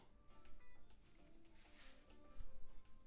flute

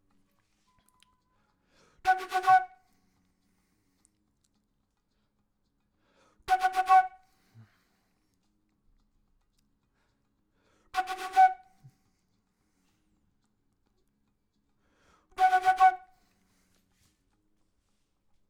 flute intro; logic

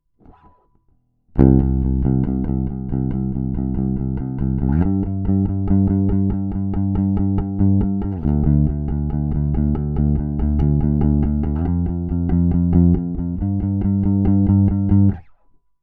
bass; logic

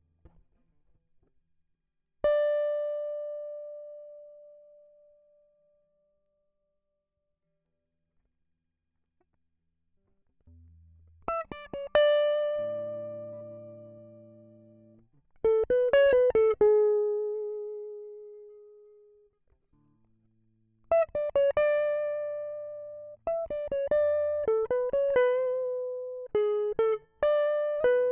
Small Bright Combo; logic

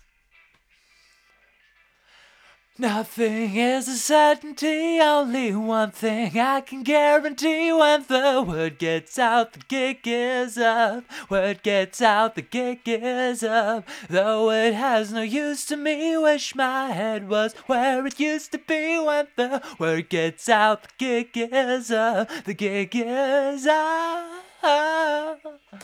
vox; logic

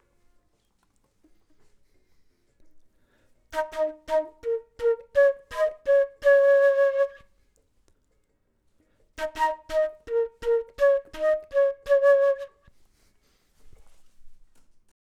flute; logic

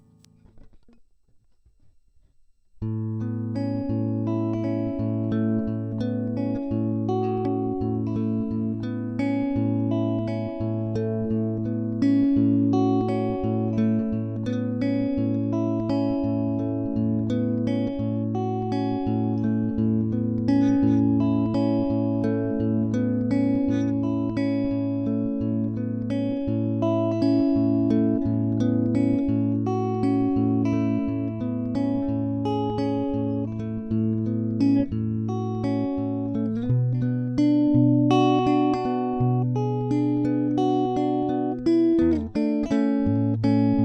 New Wave Chorus

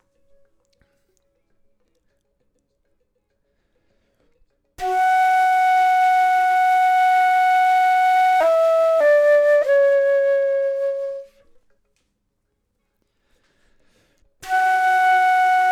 flute; logic

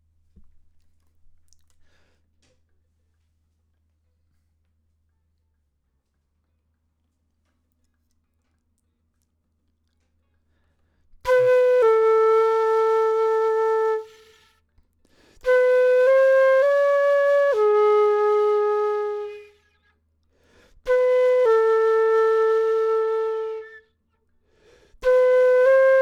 flute; logic

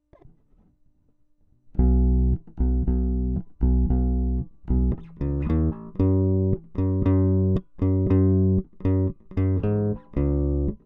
Hi-Fi DI

